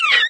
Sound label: wall pain, headcrab poison; Source Engine; video game; npc